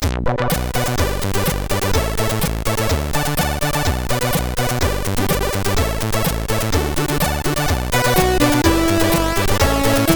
Can Only Do This Style, sid MOS6581R4

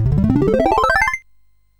Game Effects, sid CSG8580R5; Morton Adam

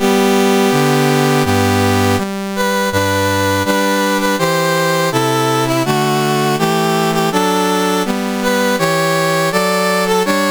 National Anthems, CSG8580R5; Greenland; Dave Marquis

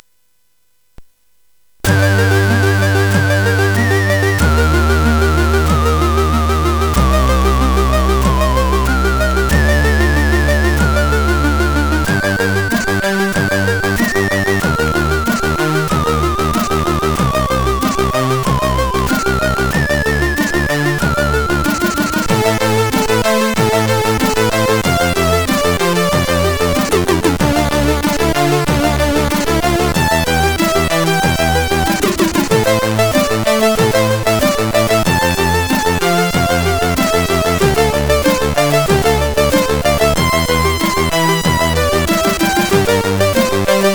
Intro, CSG8580R5; X-ample; hvsc

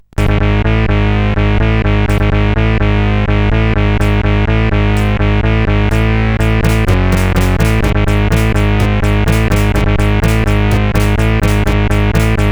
Hard Break, CSG8580R5; ASL

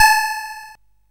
Blast Ball, CSG8580R5

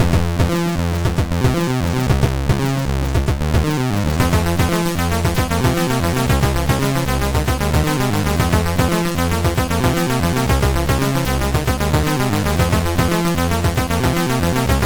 sid MOS6581R3; Birkenhead

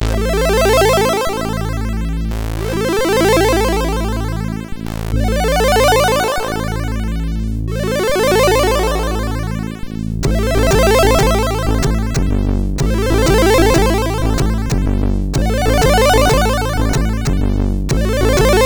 Bass test, MOS 6581

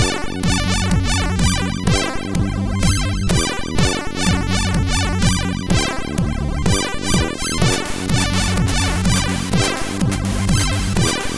Happy, MOS6581R4; sid

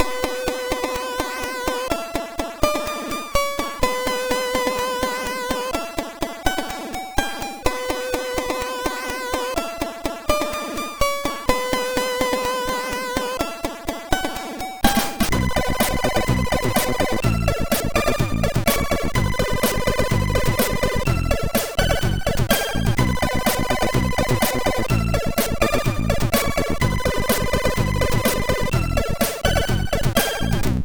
Intro Music, sid MOS6581R4

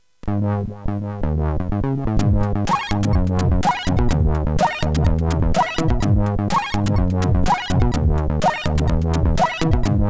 Crack Intro, sid CSG8580R5

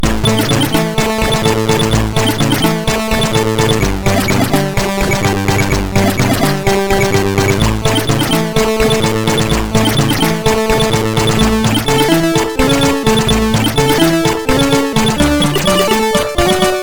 Hi Freak, MOS6581R2

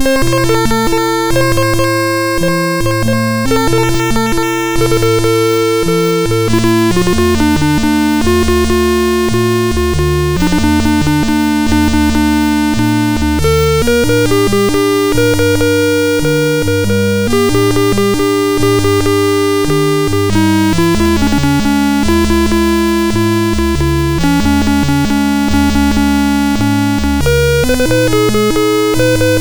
Randomly generated music BASIC, sid MOS6581R2

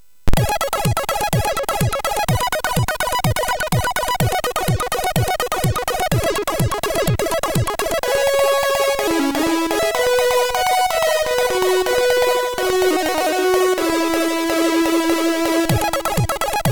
Double Voice, MOS6581R3; hvsc

